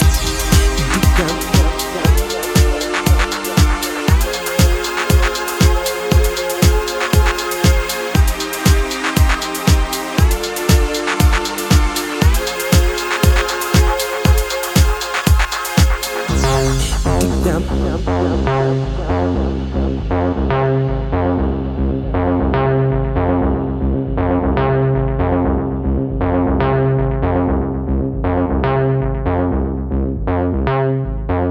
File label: instrumental dub